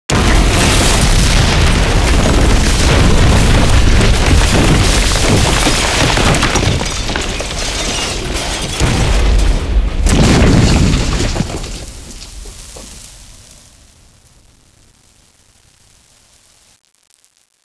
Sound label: building pipedream, ambience; svencoop; urbicide